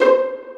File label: tock